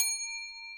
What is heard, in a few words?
BELL